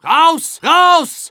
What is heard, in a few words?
Medic battle cry